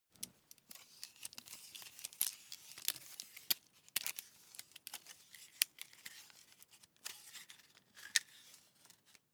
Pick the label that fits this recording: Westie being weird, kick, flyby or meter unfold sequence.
meter unfold sequence